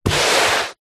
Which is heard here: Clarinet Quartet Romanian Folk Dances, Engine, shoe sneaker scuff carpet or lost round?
shoe sneaker scuff carpet